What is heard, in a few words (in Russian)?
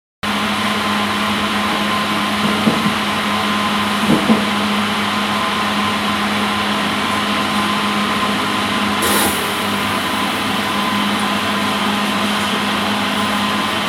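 звук производства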